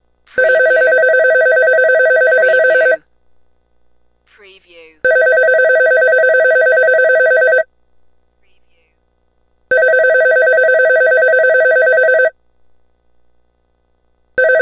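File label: Telephone 4 Rings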